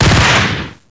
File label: ingram shot